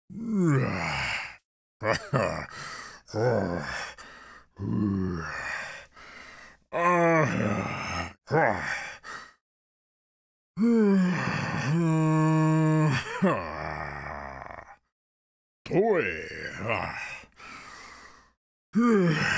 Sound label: Male Moan Long